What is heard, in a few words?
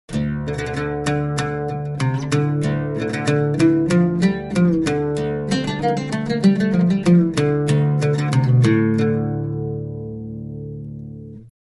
OUD